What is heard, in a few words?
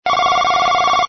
Business Ring